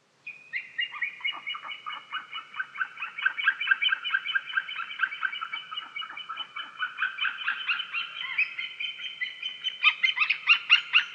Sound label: white tailed eagle haliaeetus albicilla; putni liepajas ezera zirgu sala